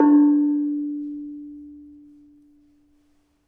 Bonang Barung, gamelan

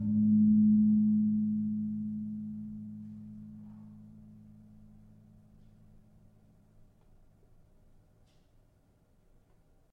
Gong 3 A, gamelan tuned; gravityharps

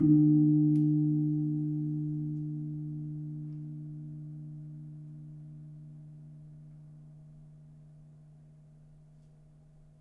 Kempul Sl 2 soft, gamelan; gravityharps; timbrebox

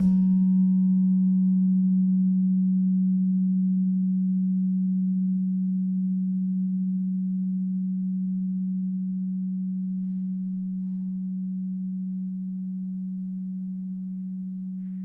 Slentem 3 G, gravity harps tuned

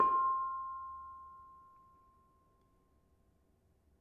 Bonang Barung, gamelan; gravityharps; timbrebox

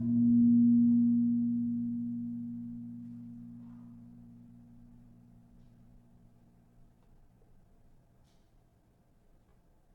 Gong 3 B, gamelan tuned

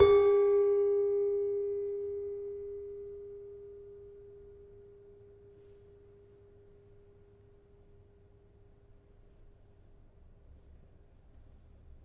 Demung, gamelan; gravityharps; timbrebox

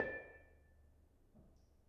Bonang Panerus soft, gamelan; gravityharps; timbrebox

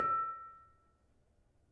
Panerus 6 E, gravity harps tuned

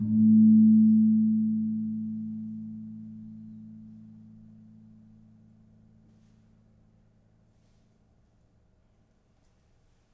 Kempul, gamelan

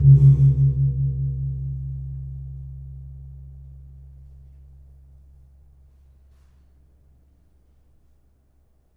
Kempul Sl 1, gamelan; gravityharps; timbrebox

